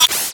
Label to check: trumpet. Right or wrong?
Wrong